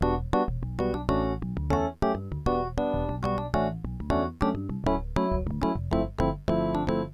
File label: e piano